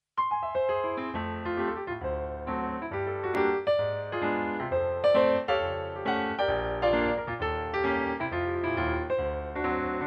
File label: PIANO